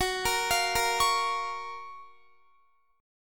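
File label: G flat Major 7th G flat Guitar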